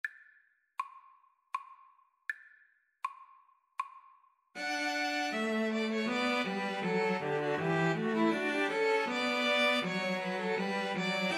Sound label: hornpipe, String trio